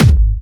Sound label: Harder, kicks